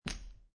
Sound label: Poker Chips Place Stack Of Chips Gently On Felt